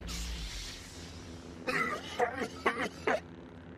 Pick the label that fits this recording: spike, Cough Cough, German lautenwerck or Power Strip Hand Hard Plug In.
Cough Cough